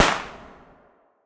betline Effect; wild turkey

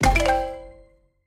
explode lion multi; serengeti kings

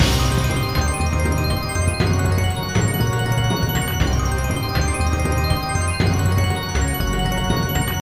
big Win Loop Count; bloodsuckers

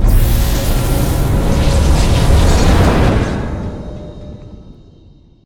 near Win; riches of midgard

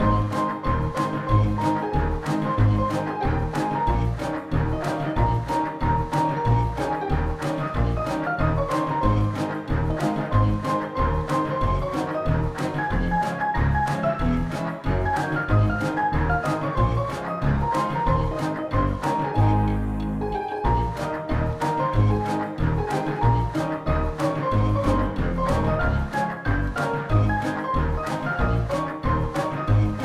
amb intro music; cashomatic

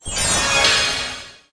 random symbol transform; fairyred

